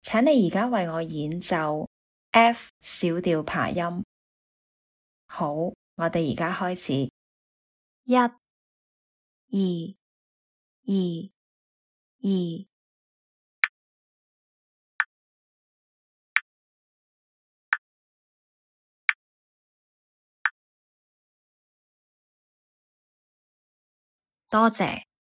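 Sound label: F minor arpeggio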